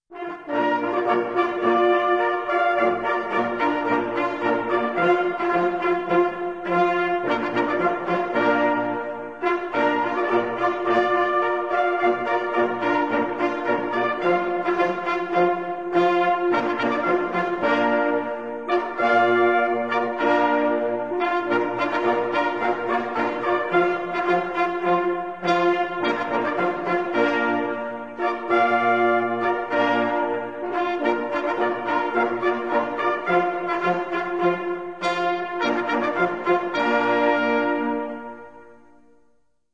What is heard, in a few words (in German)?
Lied, Jagdhornblasen; Brauchtum; Jager aus Kurpfalz